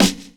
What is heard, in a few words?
SD HARD; E-Lab; X Static Goldmine 2; akai